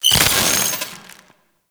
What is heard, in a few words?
Decoy Deactivate; Warframe; Loki